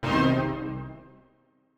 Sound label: Stabz